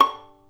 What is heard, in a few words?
Violin Pizz C; pitchglitch